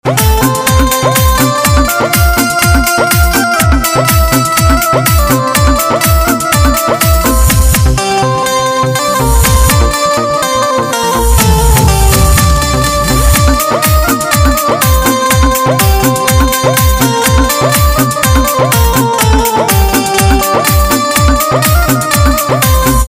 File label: Banjo Ringtone; bhojpuri instrumental ringtone